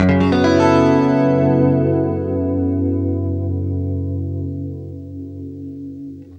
CLEAN F#M9, Guitar; East West; Steve Stevens Guitar Samples Collection

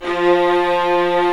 STR, Vlas Sect, Agitato; Roland L-CD702; Vlas Sect1-2

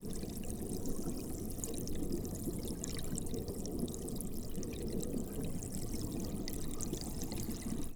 Hydrophone, Underwater, Bubble; underground sounds

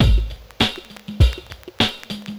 drloop, drumloops, Vinyl Attack; Masterbits

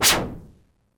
Whoosh, Korg DS-10

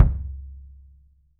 RUBB KICK; 3D Drums

